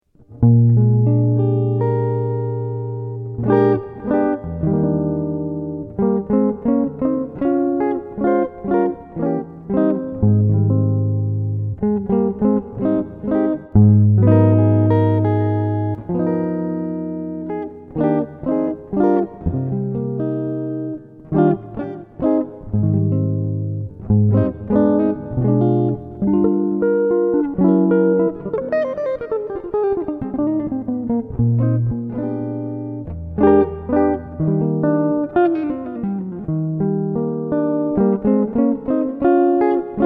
Solo Jazz Guitar

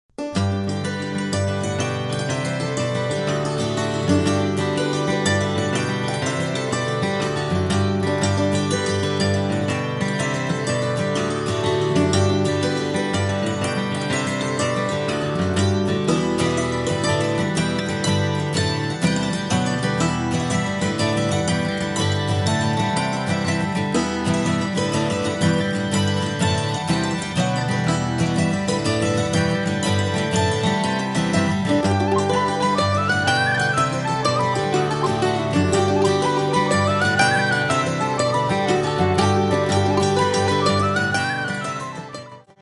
jig, Celtic duo; The Bridal Jig

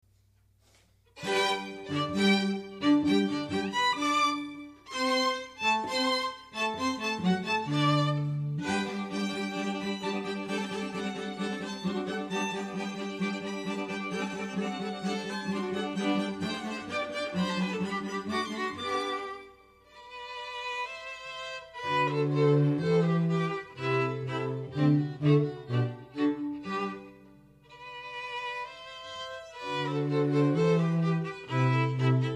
String Quartet; Chamber Music Violin

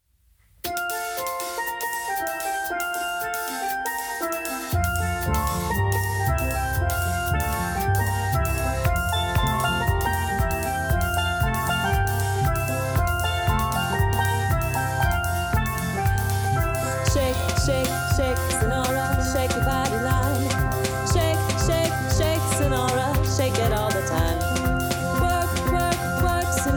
Steel

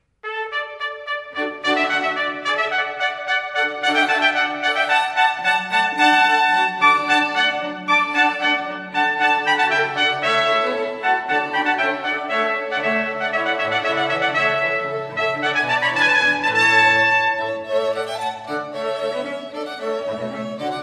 String Quartet 2 Trumpets; Handel; Classical

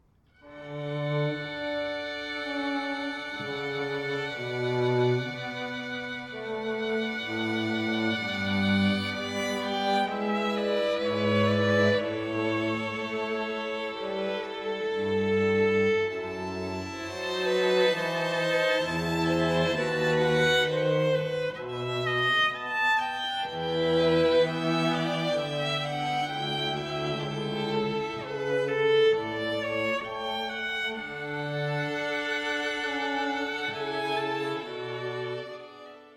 string quartet

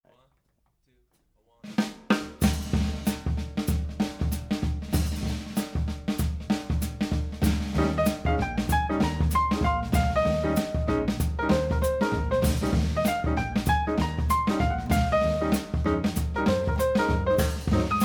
Jazz Trio

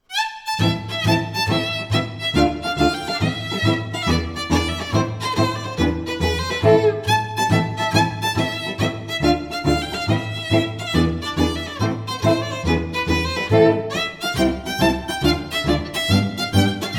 Tarantella Italian Traditional, String Trio; Classical; International String Trio

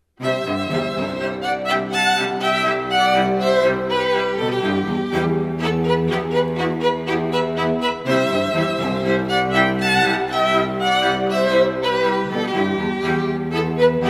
Classical Chamber Players

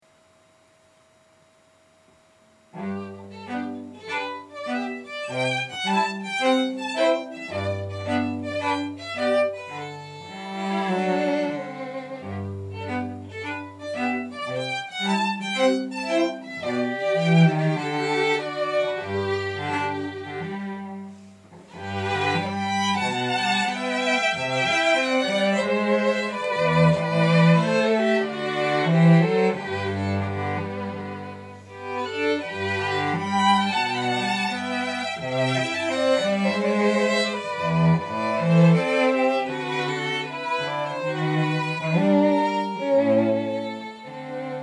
Humoresque Trio, Strings; String Quartet

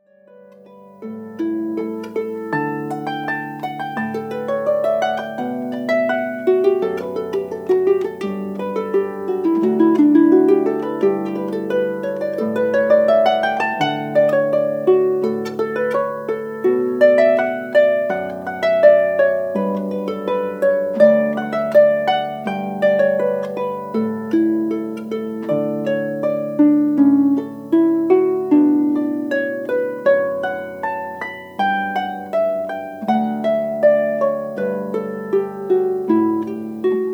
harp; Canon in D; Pachebel

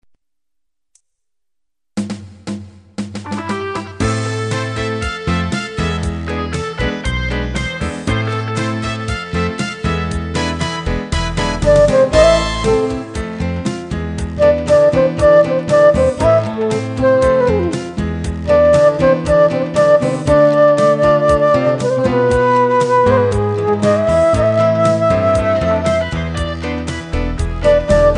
Smooth, Saxophone; Larry Clark

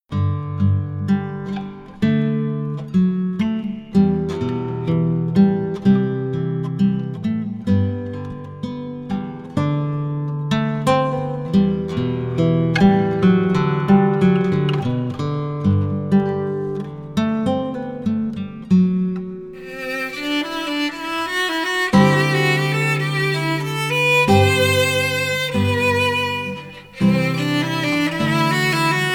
violin

